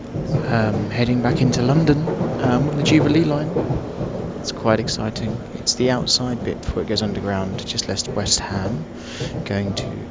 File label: sounds of the underground